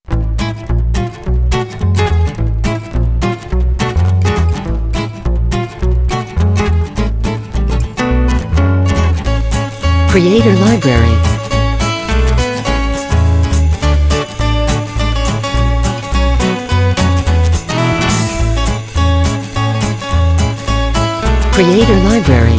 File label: Jingle Bells Swing; Jazz